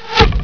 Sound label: ARROW; Kids' CD-ROM Bible Fun Pack; Education Publishing Concepts; NOAHDEMO